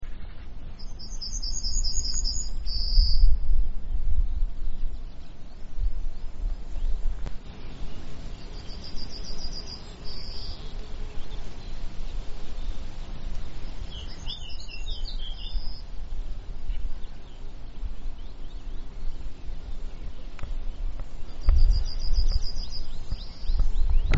Bruant

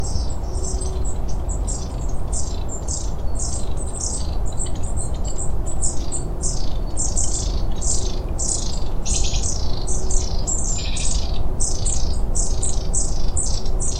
Mesange longue queue; PARIDAE, Aegithalos caudatus

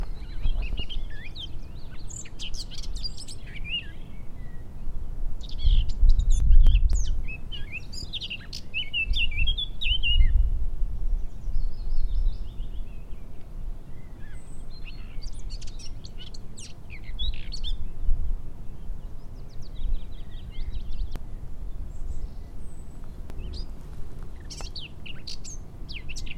Fauvette tete noire

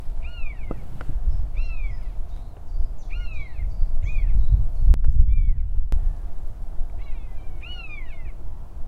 Buse variable

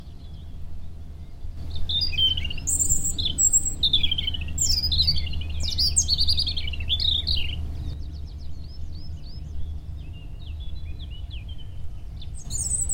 Rougegorge

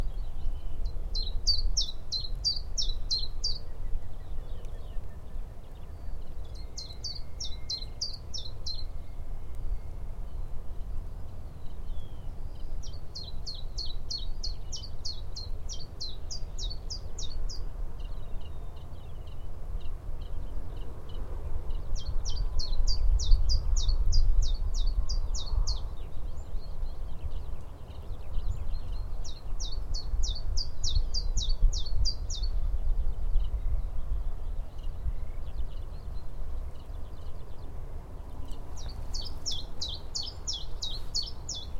Pouillot veloce

